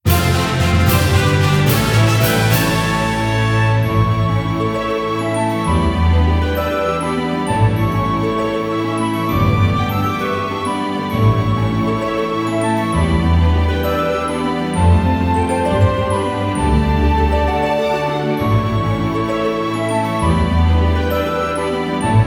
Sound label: Bgm fanfare victory